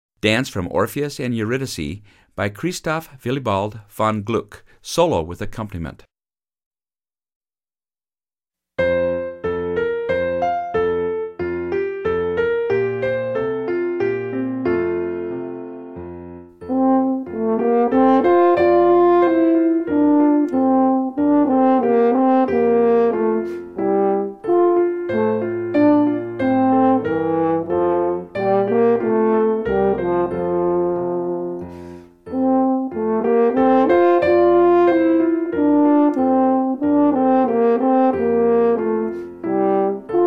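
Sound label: Horn Solo and Accompaniment; French Horn Solo with Piano – Performance Tempo; Dance from Orpheus and Eurydice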